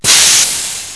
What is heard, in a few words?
rocket